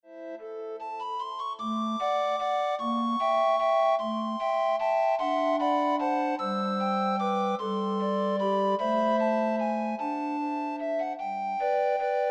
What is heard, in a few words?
Recorder Ensemble